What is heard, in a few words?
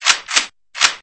shake shake